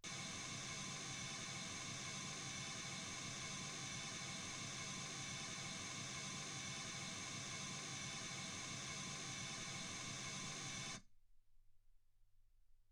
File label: mls16 48kHz